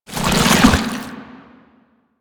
Walk Action, Foley; Enemies; Warframe; Proto Infested; Babau

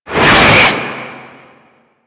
Cannon Projectile Whiz; Lasrian Tank; Weapons

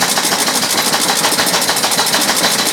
Ocean Spinning Machine Loop; Ambience; Props; Warframe; Grineer Ocean

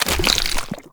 Step Sludge, Footsteps, Walk; Warframe; Tenno

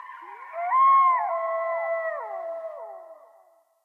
Wolf Cub Trapped Call Distant; Animals; Wolf Cubs; Orengal Wolf Cub C

